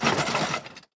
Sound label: picket fence locked; mechanics; Spearhead Demo; MacFormat 2003 June